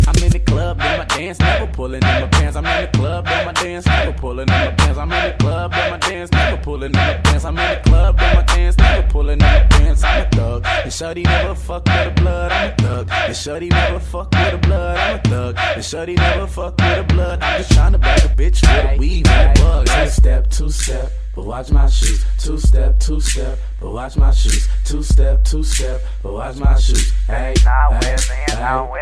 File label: 2 Stepp